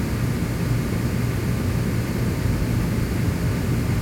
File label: room tone; birthday party bash; wii; Spanish